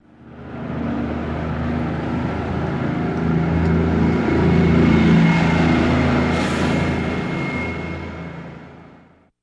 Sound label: City Bus Pass By